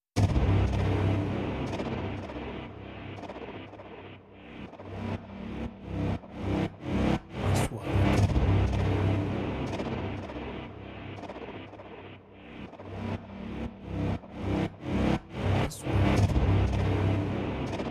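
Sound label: 3D SFX Trailer; مؤثرات تشويقية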